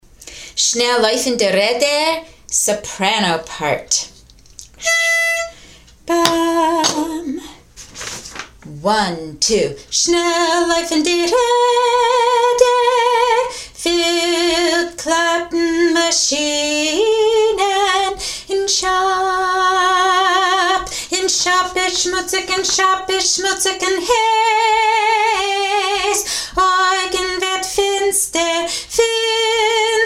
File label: soprano; Shnel Loyfn; shnel loyfn di reder